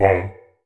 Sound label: Vocal Oneshots; Vengeance Samples; Vocals